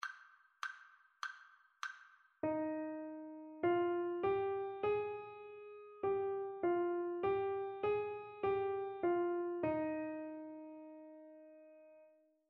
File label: melodic dictation